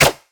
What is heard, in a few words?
Shen crit attack; LoL; TPAShen